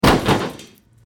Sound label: car to car crash; teststrecke-game